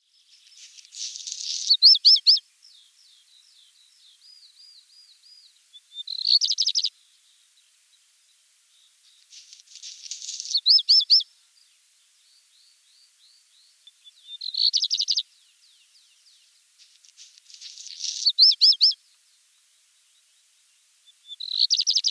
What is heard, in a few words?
Hausrotschwanz Phoenicurus ochruros song; „krchrch-tütititi“; Klaus Conrads; Vogelphilipp; Vogelstimmen